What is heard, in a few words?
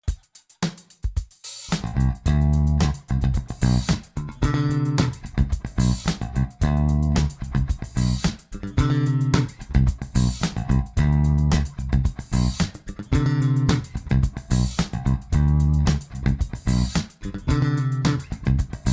Funky pick bass in D